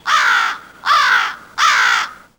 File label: crow; WAR